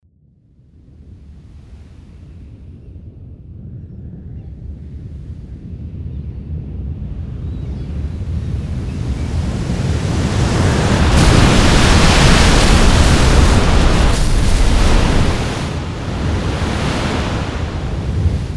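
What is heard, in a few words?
tsunami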